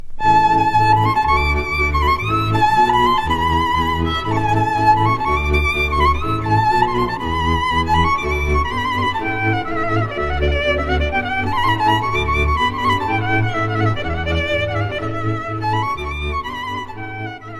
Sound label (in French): Ardeleana, Roumanie